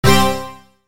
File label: break and chain